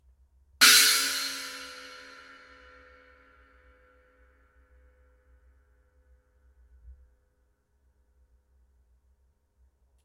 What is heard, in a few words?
zildjian